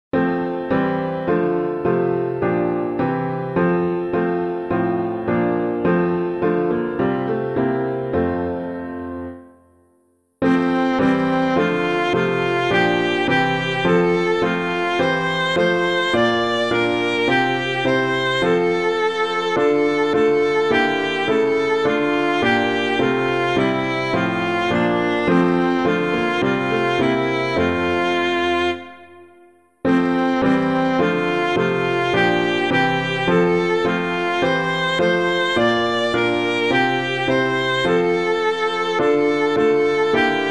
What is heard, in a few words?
piano, hymn; Come Thou Long-Expected Jesus; Wesley; STUTTGART